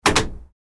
telephone buttons

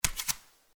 Footsteps Barefoot One

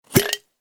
Open the shaker lid

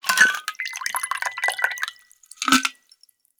Pour the cocktail into a glass; alcoholic drink

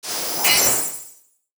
ice magic large; 魔法効果音

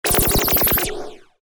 consecutive impact, mahjong; ボードゲームやカジノ、麻雀の効果音です。

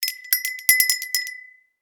Stir Stirrer

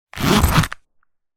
Wallet zipper

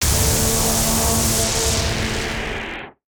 dark magic large; 魔法/法术的音效

